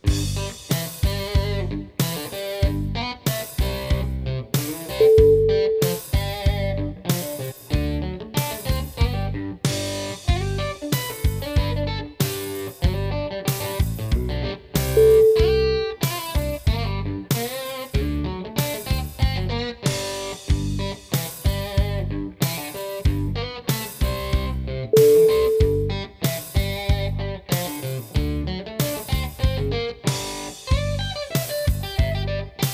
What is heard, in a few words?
southern struttin